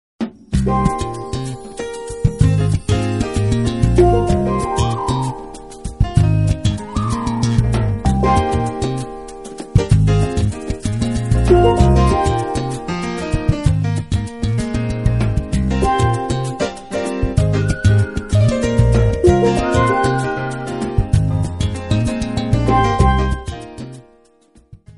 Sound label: blues